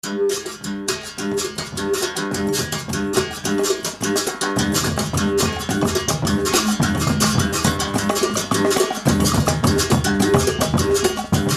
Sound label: Maracatu